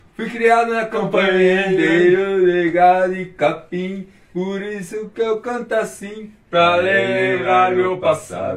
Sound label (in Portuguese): cantando